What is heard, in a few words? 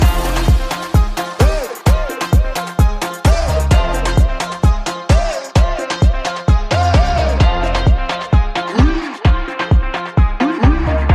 beat; pogba